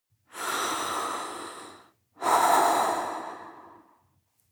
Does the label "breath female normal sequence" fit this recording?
Yes